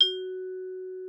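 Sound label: Xylophone F#4; Xsample VOL-4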